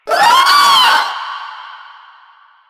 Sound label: Vocal Short Attack; Warframe; Proto Infested; Runner